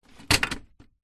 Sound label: metal chair tube small fall over concrete